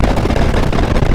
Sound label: Loopable Mechanical Malfunction Rumble